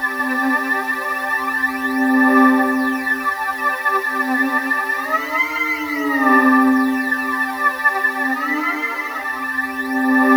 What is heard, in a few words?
C4, Synth Leads